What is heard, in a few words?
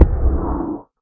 elder hit, guardian; minecraft; mob